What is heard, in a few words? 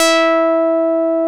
101 G, SYN; INIS